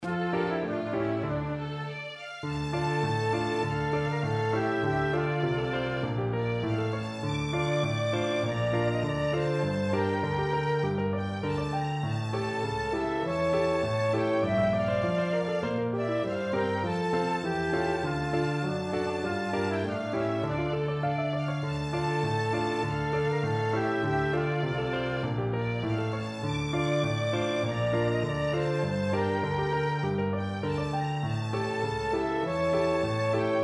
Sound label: Hevenu shalom aleichem instrumenter